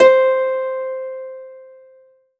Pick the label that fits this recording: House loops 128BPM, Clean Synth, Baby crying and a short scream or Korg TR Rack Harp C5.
Korg TR Rack Harp C5